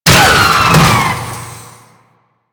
Necramech Ranged Hit React; Warframe

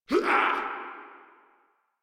Pain, Grineer Male; Warframe

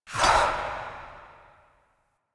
Execute Target Lock On; Warframe; Warframes; Pax Duviricus

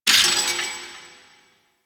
Close Metal Hits, Asteroid; Ambience; Warframe; Grineer Asteroid

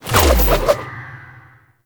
Spin Whoosh; Enemies; Foley; Generic Foley; Warframe; Duviri; Dax

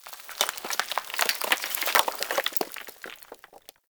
Falling Sand Rock; Ambience; Man In The Wall

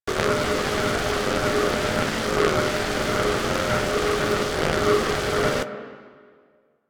Base Portal; Warframe; Ambience; Clan Dojo; Dojo Misc

